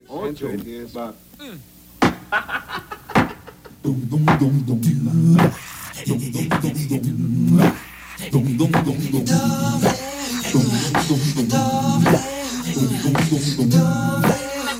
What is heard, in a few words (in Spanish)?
Promo Rap